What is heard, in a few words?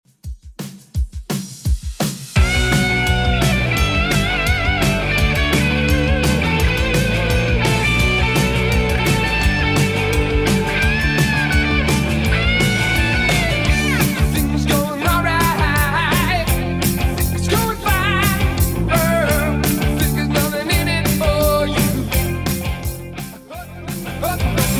80s Rock Redrum 170bpm